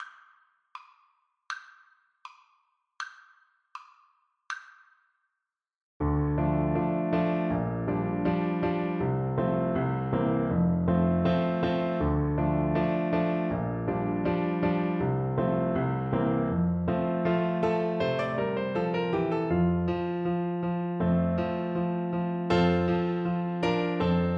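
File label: recorder karaoke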